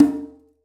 ambient tom drum single hit d key

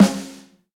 thick snare single hit g key; snare drum samples

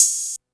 tamed open high hat sound g sharp key; drum single hits one shots; Open hi-hat one shot samples

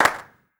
clap single shot d sharp key; percussion; single hits one shots

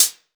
bright high hat sample g key

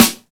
original acoustic snare sound a sharp key; snare drum samples

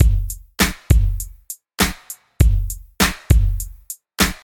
100 bpm drum beat f key

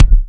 raw kick single shot c sharp key

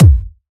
airy bass drum sound B key

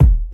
hip hop steel kick drum F# key

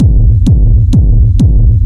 techno hard kick; drum loops

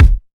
clean kick drum sample d sharp key; Duration 0:01; Channels Mono; kick drum samples

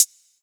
sharp hat sample A key; hi-hat

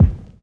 raw kick drum sound F sharp key; Mono; kick drum samples

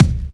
original kick drum sample c sharp key

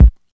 rap kick drum A key; sample

